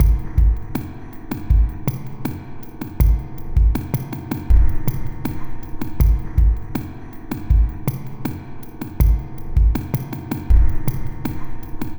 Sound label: Darkwave, Processed Percussion